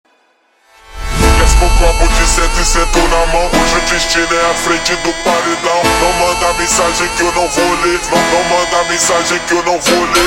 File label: Montagem super Slowed